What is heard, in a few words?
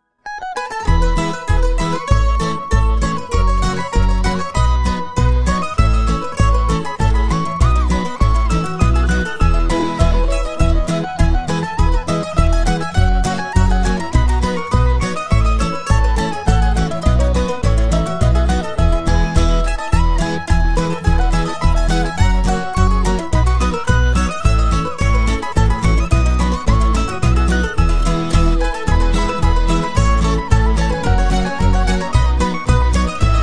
Sailors Hornpipe